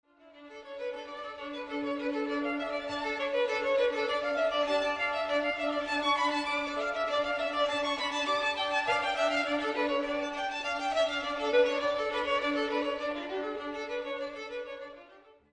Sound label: Concerto Grosso in D minor; Antonio Vivaldi